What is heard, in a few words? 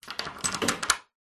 household lock bolt unlock